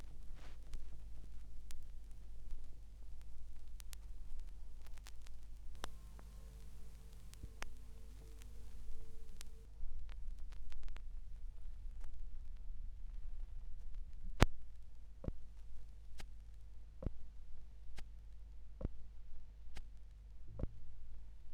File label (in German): Schallplattengeraeusche; rauschpartikel